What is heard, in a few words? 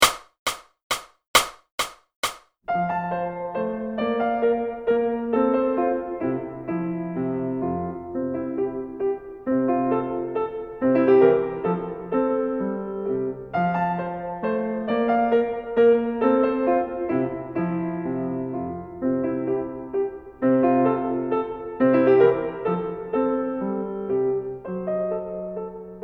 minuet in f major, rhythm ensemble